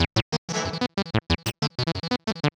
Heavy Industry Syn, Keyboards

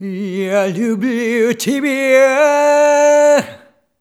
Rhythmic G

